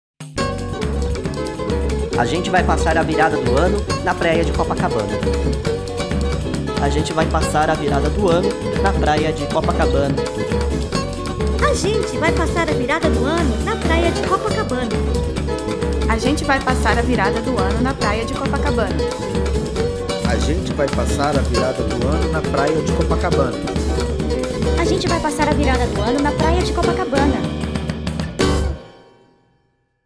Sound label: Jingle